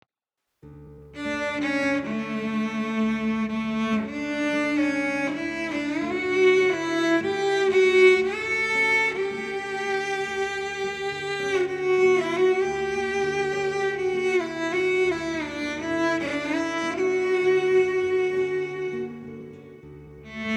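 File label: Street Cello Guitar Melancholy, 100 bpm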